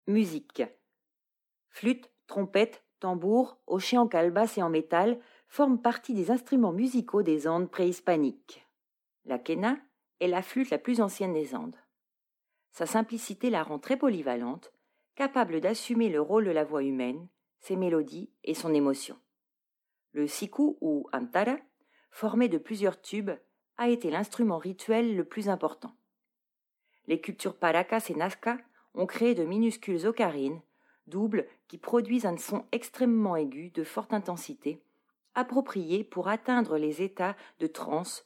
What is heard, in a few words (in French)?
musique musica; audio-guias; frances; andes centrales